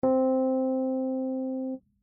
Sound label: Elec Piano